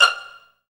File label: STR PIZZ, STR Pizzicato